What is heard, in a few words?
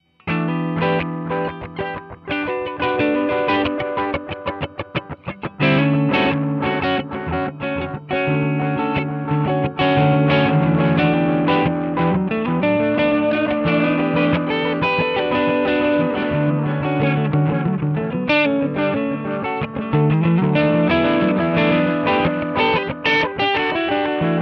mellow delay